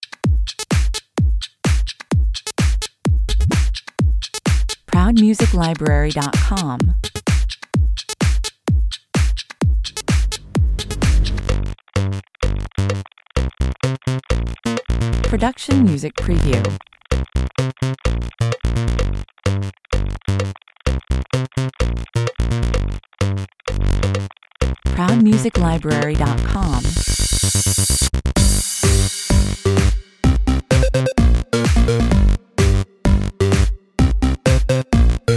pulsing beat 128 bpm

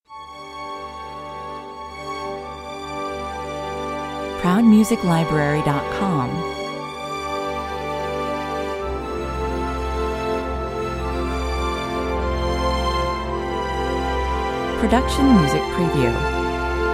commercial

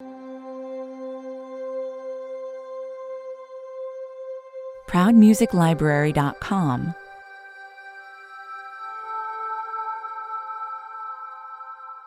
soundscape

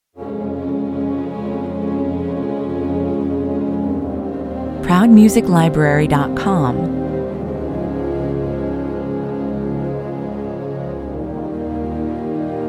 (strings only)

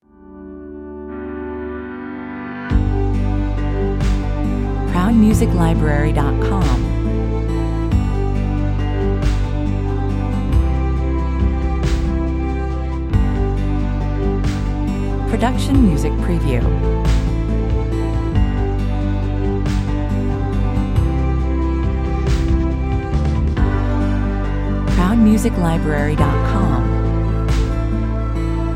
corporate waltz, 138 bpm

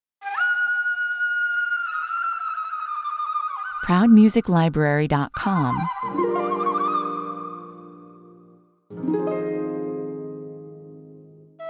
harp 119 bpm

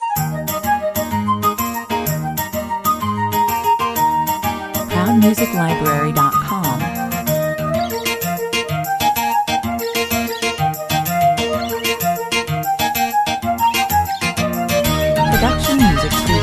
dance ye merry 125 bpm

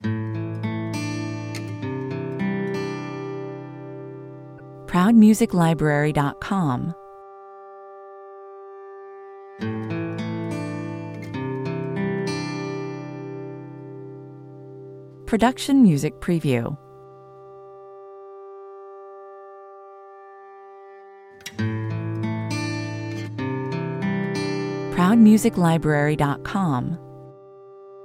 guitar only

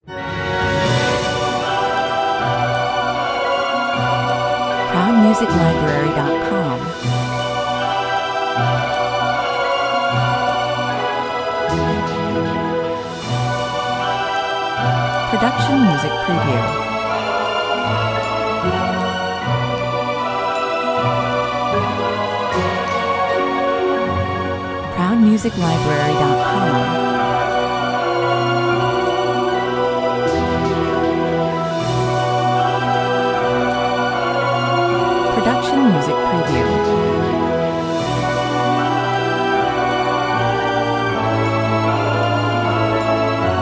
cartoon magic sequence

